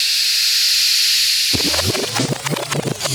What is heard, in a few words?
spin